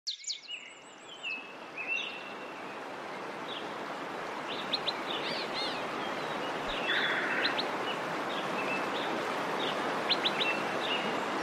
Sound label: Waterfall and Birds